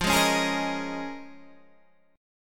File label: Eb 7th Suspended 2nd Suspended 4th